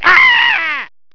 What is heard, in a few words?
AHH; os2